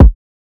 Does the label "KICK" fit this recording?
Yes